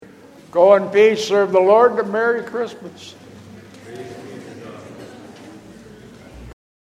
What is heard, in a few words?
Closing Response; Christmas